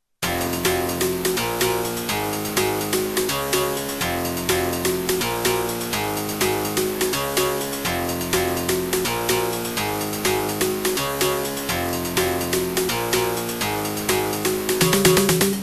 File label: rock